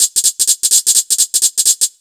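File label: 127 drum 2 L, Fresh Disco House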